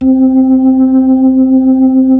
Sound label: B-3; Roland LCDP10 Keys of the 60s and 70s 2; Club Ch. Xfde